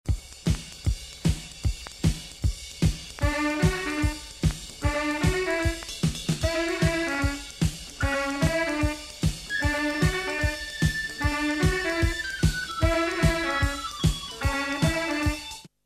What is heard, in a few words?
Music Pad; Energy